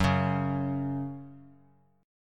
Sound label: F sharp 5th F sharp; strummed; JGuitar